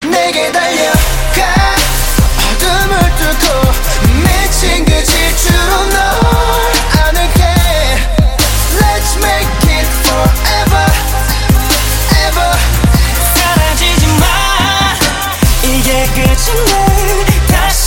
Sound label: Chorus, ringtones